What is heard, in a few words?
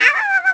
"Ahohoho"